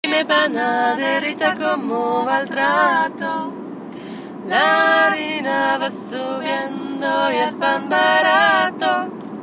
2 voix; Panaderas dime

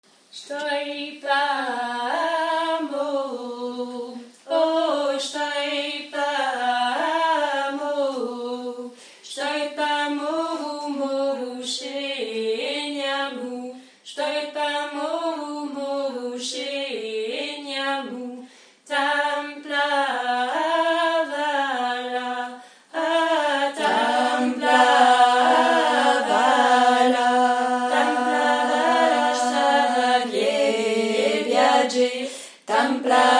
voix 1 et basse; Stoj stoj pa moru

